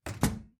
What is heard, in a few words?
Microwave Close; lethal company